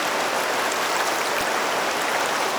water

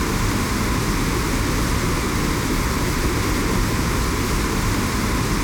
FW-190D9 Plane Wind In R; DCS

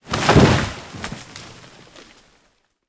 tree fall; War Thunder

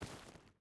footstep barefoot stones walk; Rust

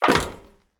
landing proflist; Escape from Tarkov

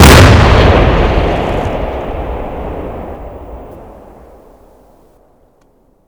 Inf Weapons; Red Orchestra 41-45

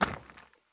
sprint glass; Escape from Tarkov

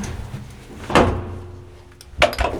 Vehicle reloads; Red Orchestra 41-45

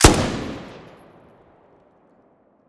m249 attack; Rust

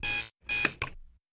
gun reload; World of Tanks

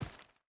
footstep burlap stones run; Rust

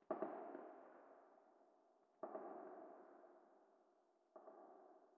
ambient; World of Tanks

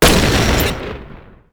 drone explode

